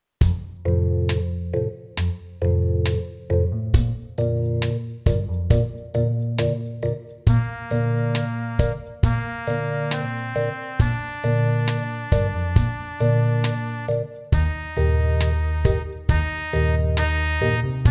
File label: Pease in Bb, backing tracks